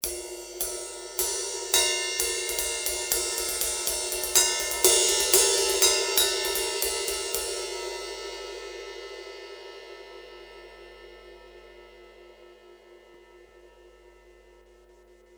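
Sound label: med ride 20 performance, cymbal; sabian; b8pro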